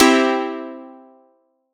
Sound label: FM Piano Chord; Big Fish Audio; OSH Chords